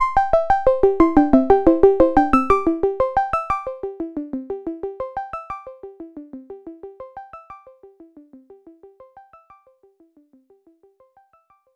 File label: 90 Bpm Attack Loop Sine Tom Melody